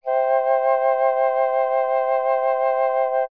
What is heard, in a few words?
flute C5 harmonize; harmony